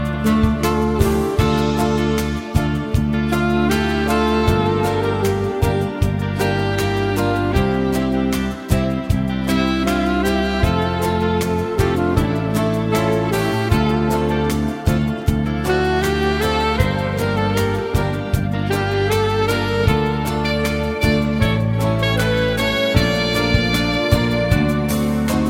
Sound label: sax